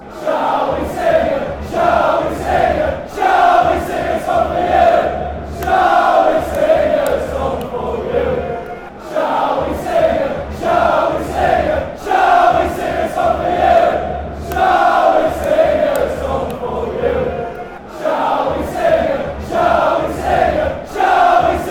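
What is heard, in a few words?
shall we sing a song for you, fanchants; york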